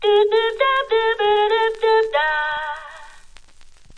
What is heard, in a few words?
100 bpm Crackle Line